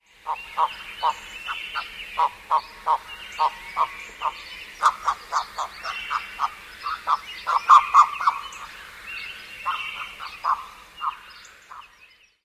various croaks

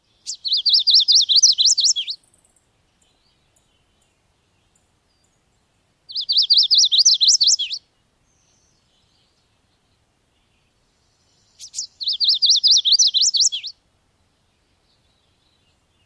dawn song amp calls

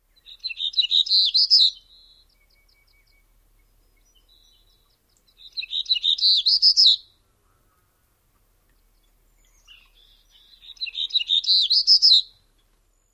songs

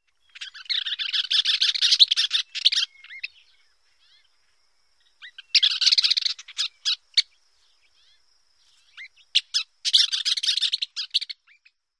sputtering outbursts

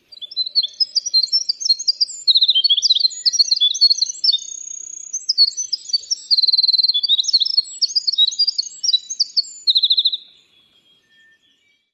song eastern

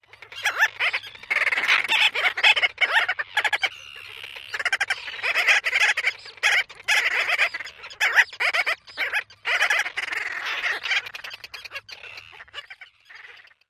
excited calls at nest

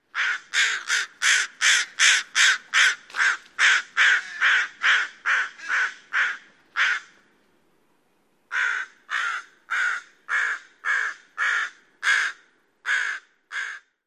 rapid calling; WA

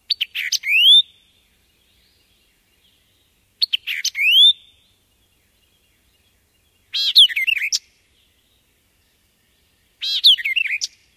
song

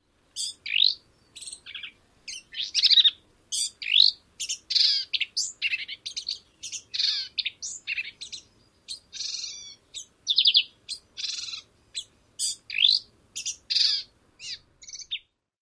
jumbled song

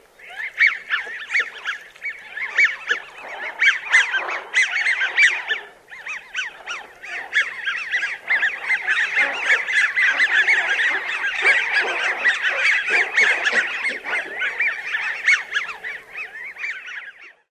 courting group

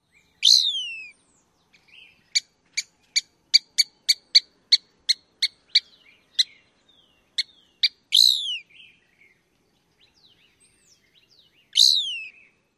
calls; TX

